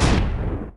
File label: boss SFX; chiikawa-big-event; ChiikawavsChimera